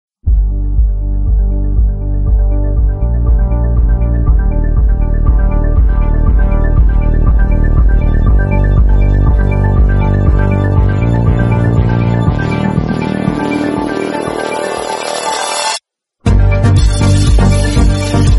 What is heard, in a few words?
Xiaomi Redmi K60 Pro Ringtone